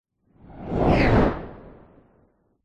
science fiction craft race past zoom ultra fast; zapsplat

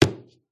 pub can place on beer mat; field reports

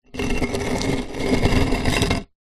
slab stone large drag on stone; foley; zapsplat

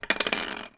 office pencil put down on table and roll short

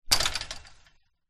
impact fence chainlinked hand

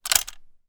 gun mosin nagant; still north media

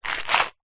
box card small safety pins movement lid on; household

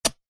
nature ice block stab dig sharp tool into single

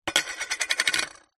badge metal set down table spin

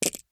household keyring plastic drop carpet

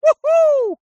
human male shout woo hoo

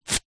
human wax strip rip peel skin remove hair

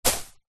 sport soccer ball hit plants bush; zapsplat

